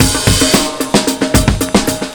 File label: Mondo Bongo; Zero-G; Beneath The Planet of The Breaks